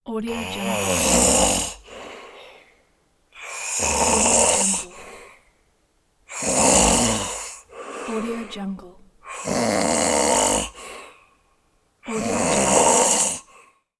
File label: snoring guy